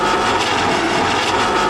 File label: ex CMED, F1; AOS F1 2022